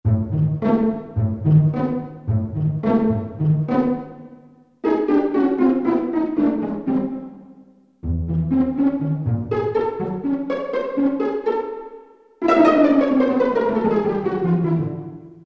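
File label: Strings Pizzicato Section; Roland L-CDX-04 Strings And Orchestral Percussion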